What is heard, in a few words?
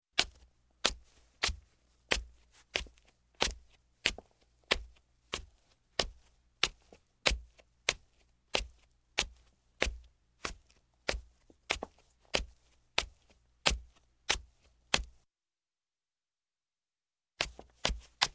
Footsteps, Wood Up Wooden Steps Male, Slow; Sound Ideas 2000 Series